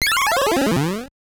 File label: Lose Life; nuts milk; nes